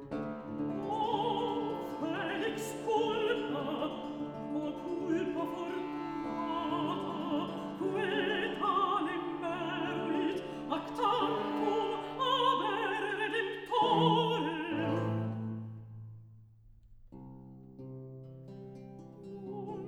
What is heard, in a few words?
Recitativo; Antonio Vivaldi (1678 - 1741); Vivaldi - Mottetti (2003)